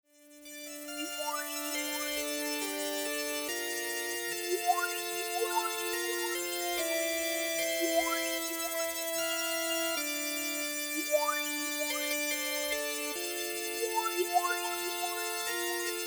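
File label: space organ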